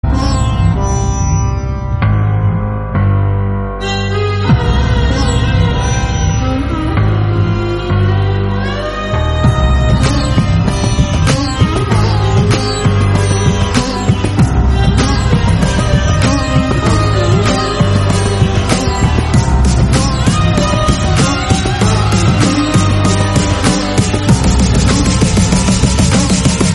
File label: bgm tamil; rolex; vikram; suriya